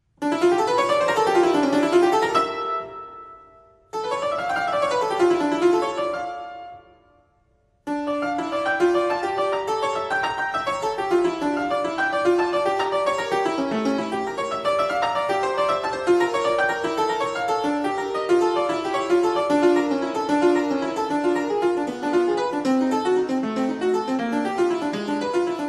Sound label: fortepiano; strohm